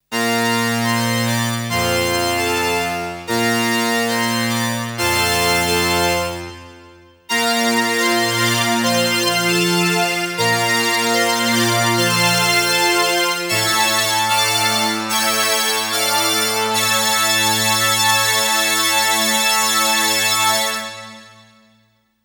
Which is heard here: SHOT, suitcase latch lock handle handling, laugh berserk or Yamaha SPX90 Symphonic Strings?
Yamaha SPX90 Symphonic Strings